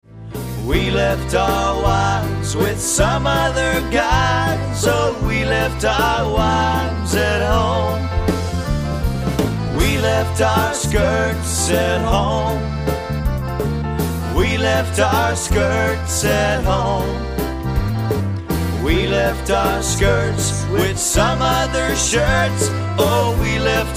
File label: Waltz